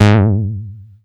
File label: Bass Juno G#; Vengeance Samples